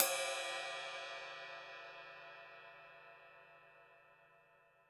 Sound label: huge ride cymbal sound clip c key